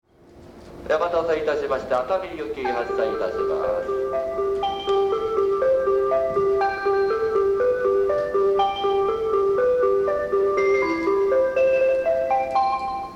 melody

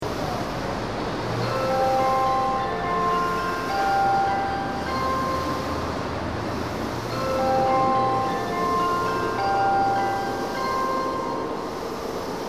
melody, station

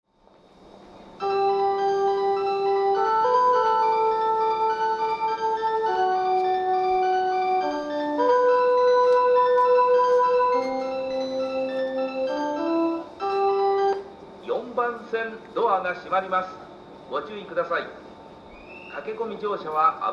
melody, station

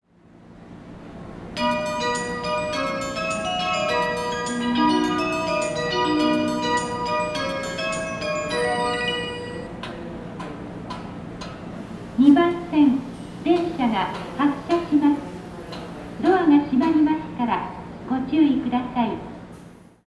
melody, station

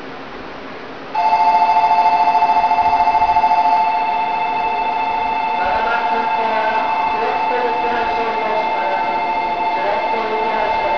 tokyo bell, station; jreast; tokaido

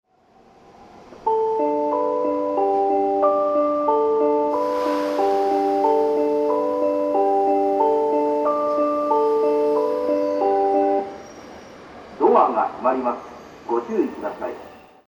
melody

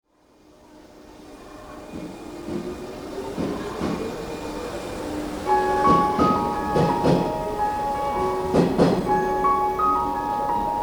shinjuku melody; 12番線（山手内）発車メロディ; 「ピアノの速い音節と鈴」; jreast; yamanote

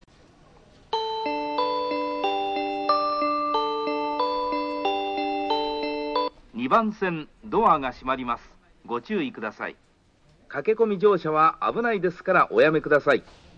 melody

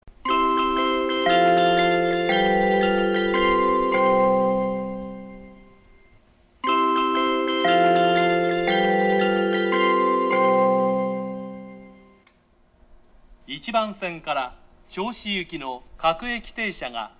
melody

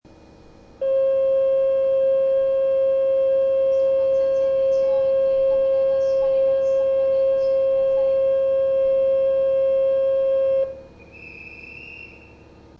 midosuji bell; osaka

